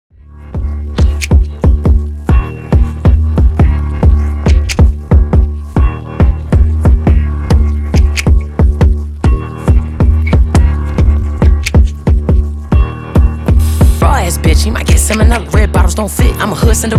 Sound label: Extended 138Bpm